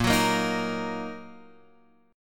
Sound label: A sharp Suspended 4th Sharp 5th A sharp down Guitar Open F; strummed; JGuitar